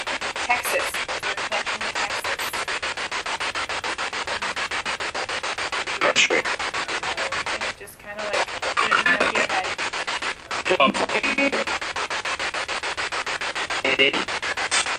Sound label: Spirit Box; Hallway; private residence freedom road apartment investigation return